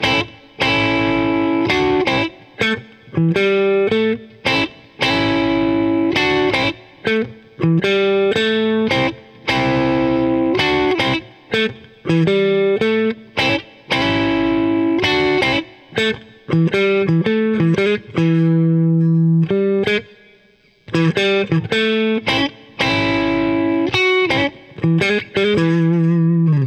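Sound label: Blues Guitar; Pro Tools Essential Loops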